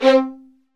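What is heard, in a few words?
Violins Marcato; Roland LCDP13 String Sections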